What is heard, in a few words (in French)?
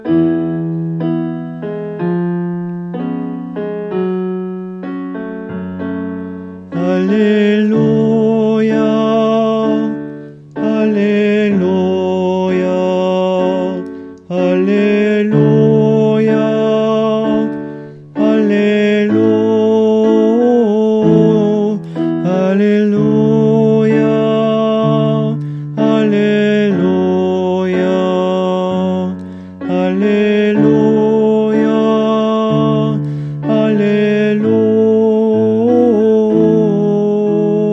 Refrains Voix